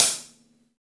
V-Pop Closed HiHat

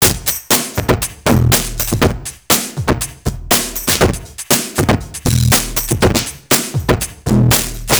Vintage Distorted, drums beats

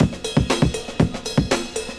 ride this, Breaks

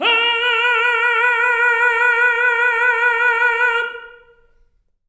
TENOR A#4, Voices; Spectrasonics; Symphony of Voices

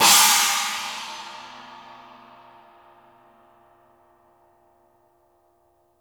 PANG SPLSH, cymbals; Sampleheads - New York City Drumworks VOL-1; SW CYMBALS

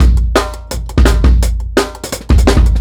Big Up Kit 2-Bar, Drum n Bass Beats; KJ Sawka; Drum n Bass & Beyond

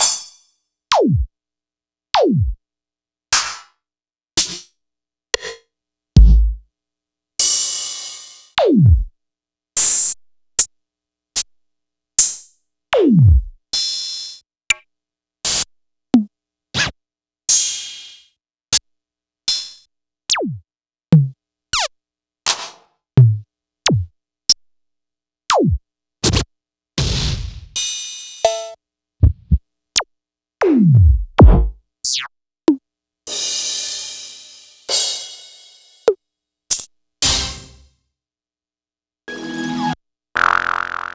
Yamaha Motif-ES8

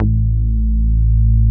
SH 101 BASS

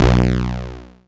Heavy Duty Bass; Big Fish Audio; Old Skool House; OSH Bass

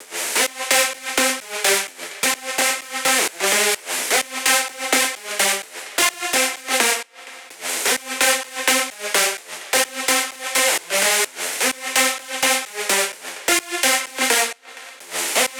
128 BPM lead Synth Add Wet SC, G; Vengeance; Essential Clubsounds Vol.5; Demo Constr Kits; Sidewalk Kit

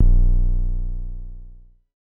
DSM2 Sub; MPC DSM-2

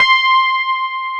METAL EPF C5; AKAI S-Series CD-ROM Sound Library VOL-2

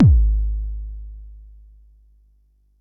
Bassdrum; Jomox Xbase09

